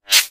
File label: ui air page; Source Engine; Portal 2